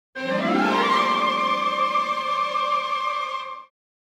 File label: Exploration; heroes of might and magic ii 2013